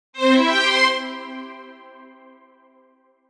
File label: start, oven; prime oven